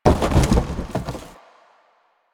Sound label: Cardboard Boxes Destroy; Warframe; Ambience; Nineteen Ninety Nine